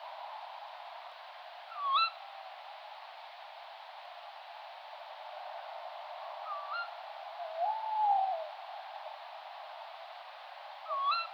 call, tawny owl, Strix aluco, Background: amphibian and Hooded-crow calls.; made with an unattended automatic apparatus.